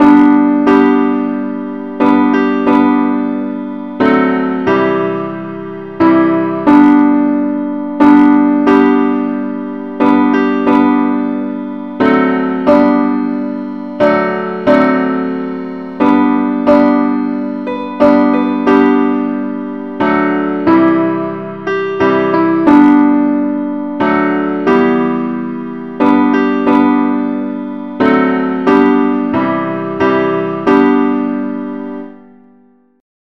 simple acc, key g

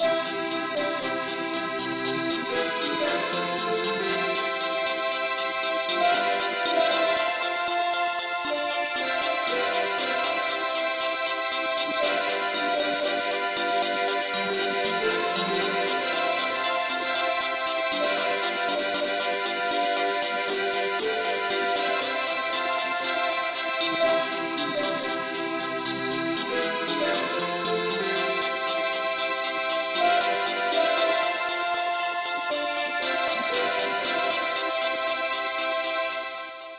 mandolin Lead key c

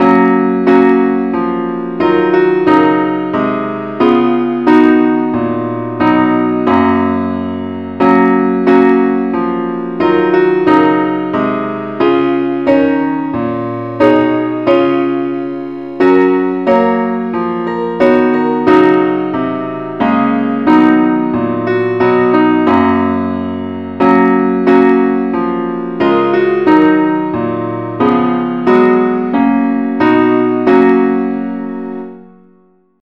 parts only key fis

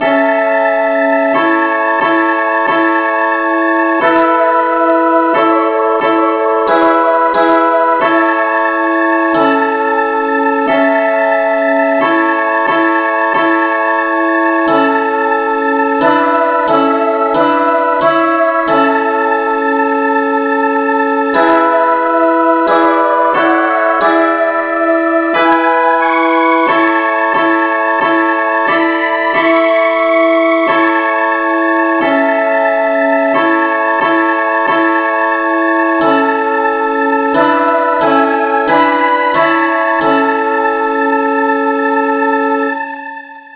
rev no vocal key a

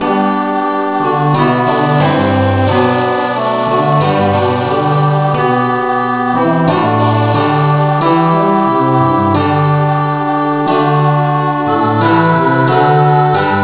christe Sanctorum no vocal key g

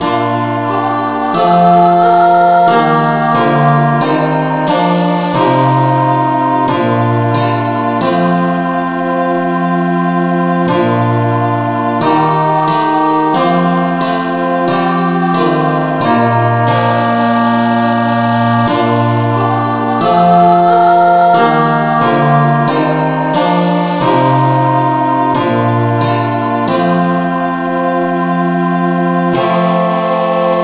rev no vocal key bes; Gwalchmai; Glory be to God on high

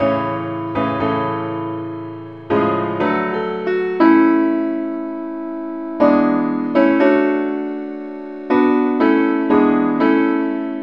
simple acc key a; pilot

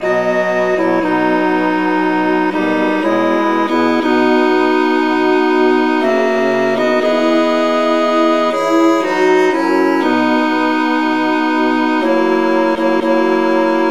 traditional key E

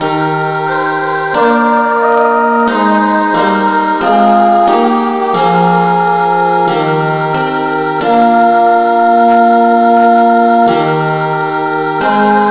revnovocal key ees; Gwalchmai; Glory be to God on high

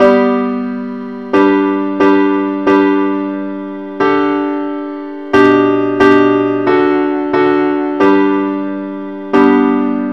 parts only key g

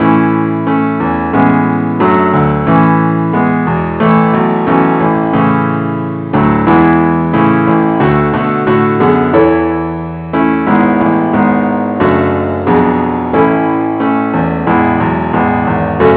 keyboard only key a; christe Sanctorum

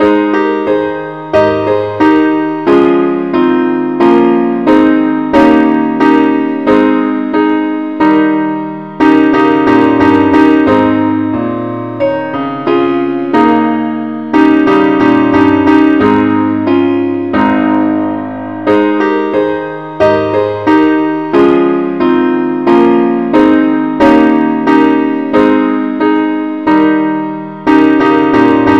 keyboard only key aes; glory be to god on high; gwalchmai

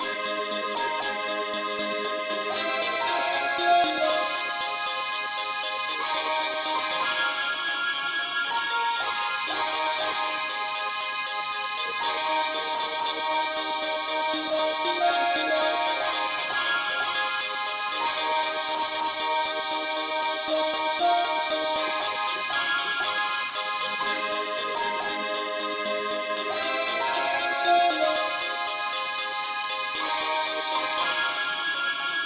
uke lead key aes

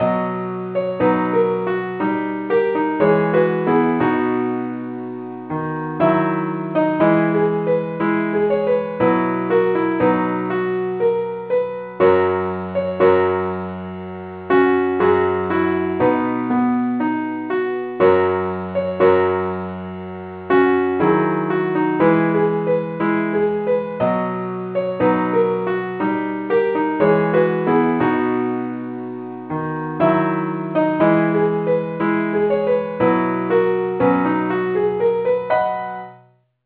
keyboard Only key E

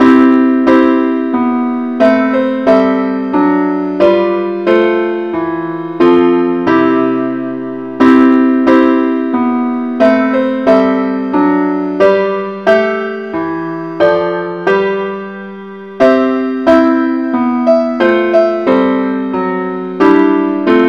parts only key c

